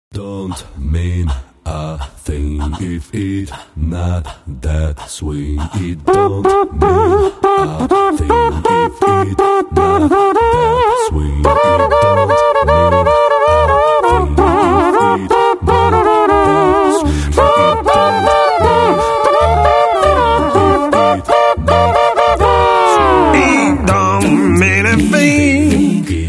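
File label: a cappella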